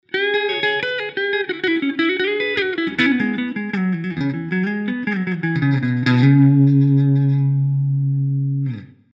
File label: Amplitube crunch fender